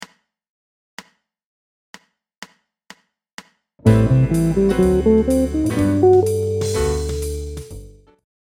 Major ii V I Outlines, guitar

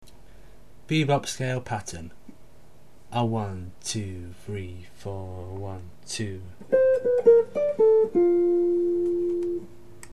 dominant 7th bebop pattern, guitar

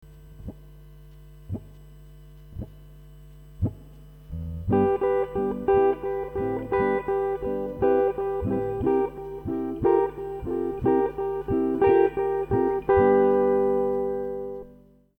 Blues Guitar Licks Example

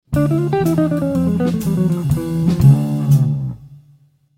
Melodic Line

